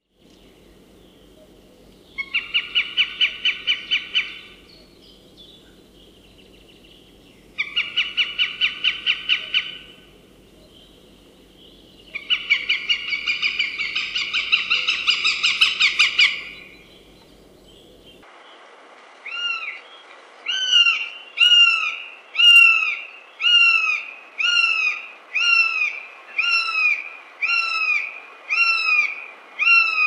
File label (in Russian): teterevyatnik golos